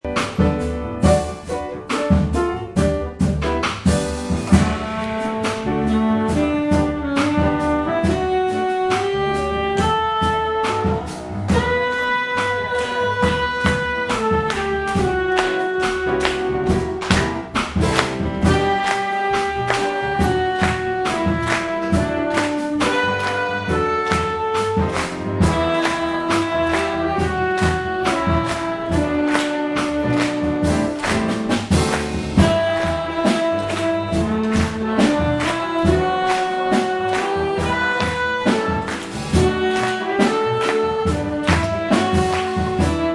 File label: jazz